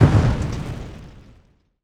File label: Fireball impact, imp fireball; Doom